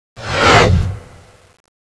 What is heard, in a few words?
smash, tentaculo; CSO KrakenBoss